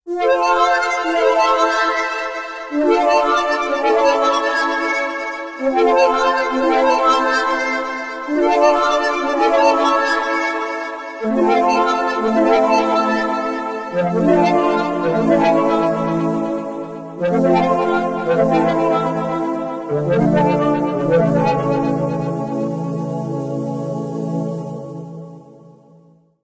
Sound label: Future Synth; Ambient FX